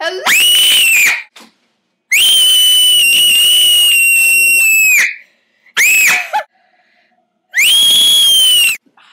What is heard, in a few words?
loudest scream ever